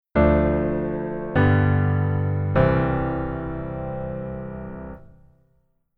keyboard voicing low

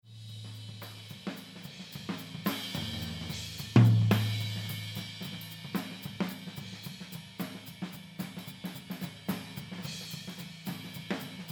Drums H Tom

